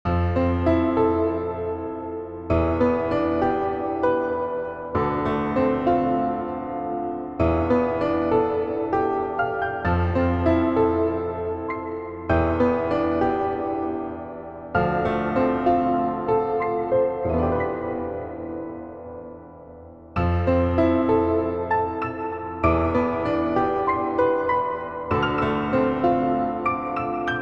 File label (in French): paysage; battle garegga anthology